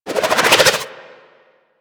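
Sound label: submachine gun Alt Projectile Deploy; Weapons; Warframe; Tenno; Sundial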